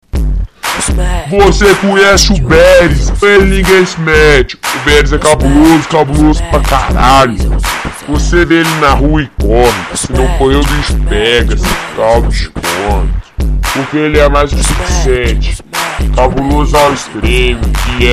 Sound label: rap